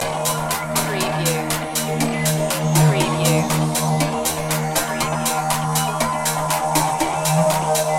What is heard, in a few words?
soft techno pulse loop